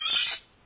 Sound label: bitcrushed knife